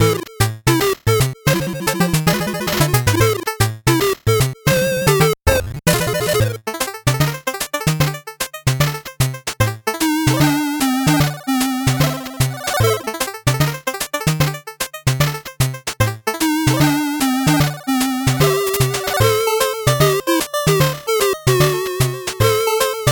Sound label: 8bit bgm fast